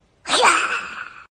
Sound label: hyaaaaaa